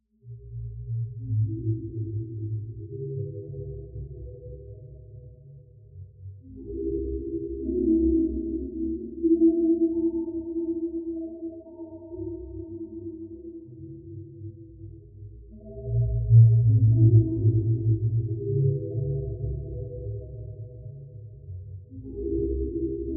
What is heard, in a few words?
reversed ducks slow